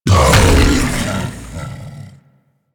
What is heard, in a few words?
Bowl Stop; DE; Warframe; Warframes; Grendel